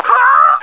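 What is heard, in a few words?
hhoiiiuh, male; stars; taunts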